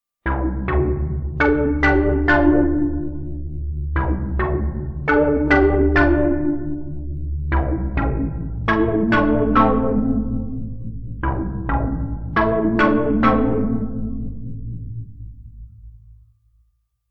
Glocken Spiralised

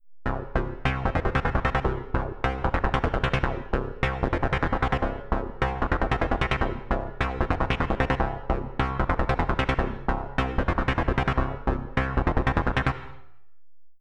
Blip

